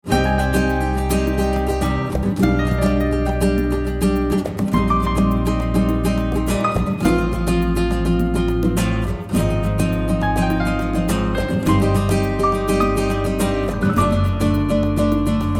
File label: Dance Portuguese